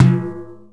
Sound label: Bounce variation